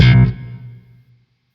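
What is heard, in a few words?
Sentient Shawzin Slap Octave One B; Chords; Warframe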